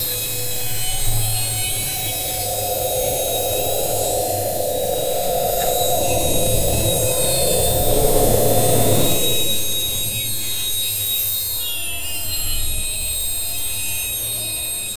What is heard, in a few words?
flying drones drop; SFX Engine